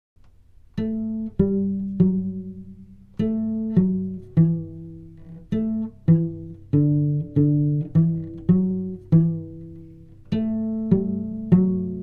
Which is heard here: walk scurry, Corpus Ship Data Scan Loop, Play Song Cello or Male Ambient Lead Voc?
Play Song Cello